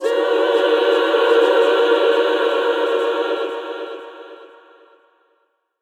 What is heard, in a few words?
ghost Chant